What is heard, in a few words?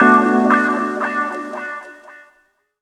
Sovreign Guitar